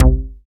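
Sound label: PER PROCU, Procussion, percussion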